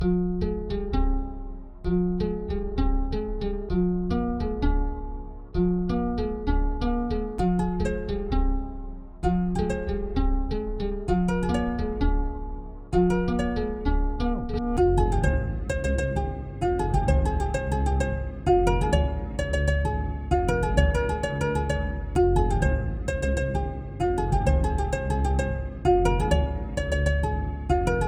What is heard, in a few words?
Trap; Trap gang